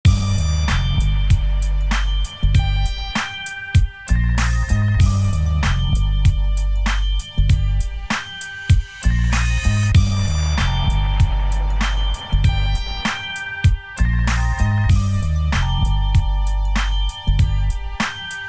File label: music